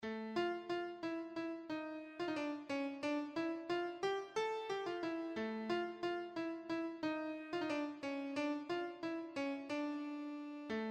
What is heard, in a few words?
Grandmother Tells A Ghost Story, keyboard